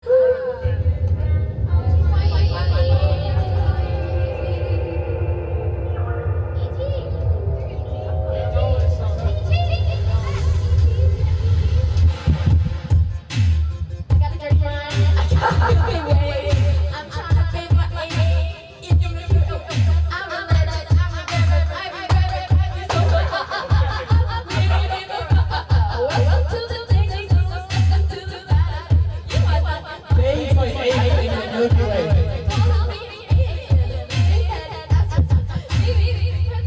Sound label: karaoke; Beijing, Shanghai, Hong Kong and Macao